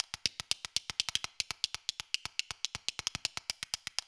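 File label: 120 bpm, Spoons; Spectrasonics - Supreme Beats - World Dance; Daoud Spoons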